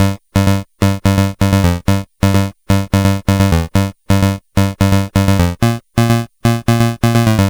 Game Boy Bass; 8-Bit; Chiptune; Nintendo

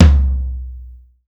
tom dry low, Yamaha Motif ES-Series; 300 Drum Machines

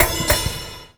Metal; Sonic Foundry (Sony Creative Software); Esoterik Beatz; Bello

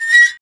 FX metal; Sound & Vision - Gigapack II

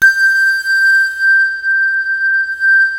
MALLET SYN G5; Greytsounds VOL-1; VFX

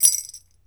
BEL RATTL, African Perc; E-MU Producer Series Vol. 7 – Old World Instruments

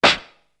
champ, snares; Zero-G - Funky Elements

